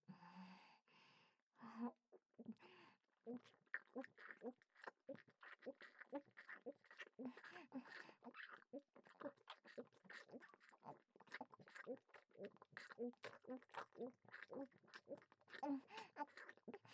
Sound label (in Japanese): 目の前でやきもちピストンフェラ; フェラチオ切り抜き集; 鈴姉